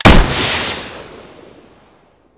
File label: rpg7 fire; explosives; weapons; swb